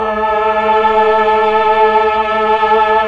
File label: PAD, Slow Str+Vox; Roland LCDP09 Keys of the 60s and 70s 1